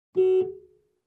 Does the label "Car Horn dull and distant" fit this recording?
Yes